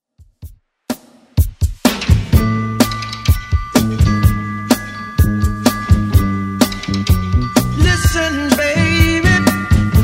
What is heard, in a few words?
60s redrum 126 bpm